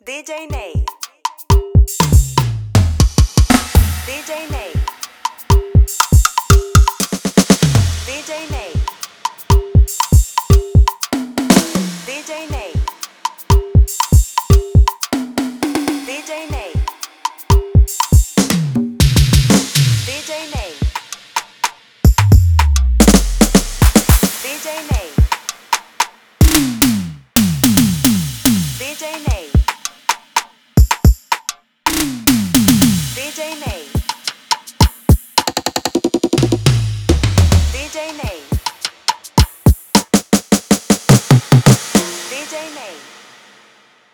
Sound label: FILL REPARTO